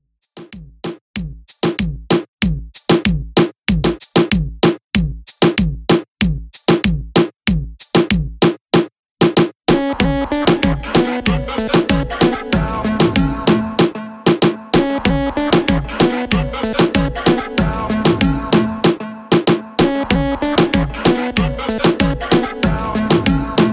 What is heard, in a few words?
Reton 95Bpm